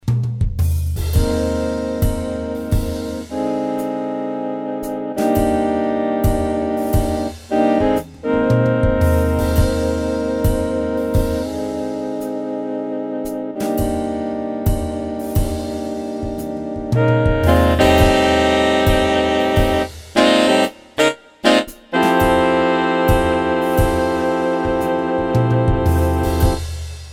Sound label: tenor sax